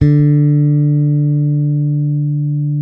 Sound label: bass, sustain; Sampleheads; Will Lee Bass Library